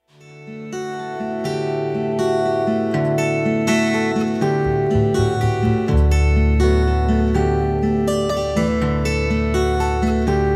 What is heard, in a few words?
Saxophone Trains